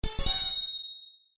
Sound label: UI Rollover Major, User interface feedback sounds; Computers; Electronics